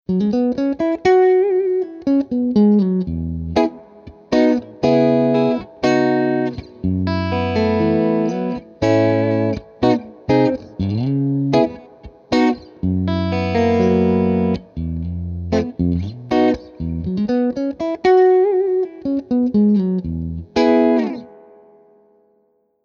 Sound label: Rockerverb RK50 MKII 112 Clean Ibanez JEM7VHw